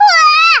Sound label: Child, Death Wails; Riviera